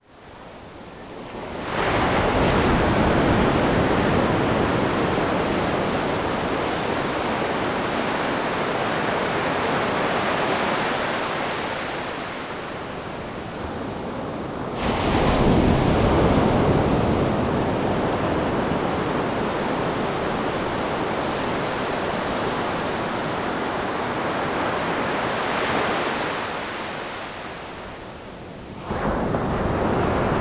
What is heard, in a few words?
ocean, ambient